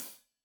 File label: Hat Loose, Hi-Hat, Cymbals Stereo